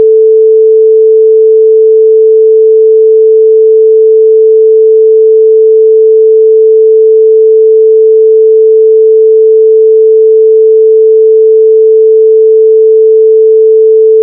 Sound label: Ukulele G 440, Сигнал 440 Герц (A) для настройки укулеле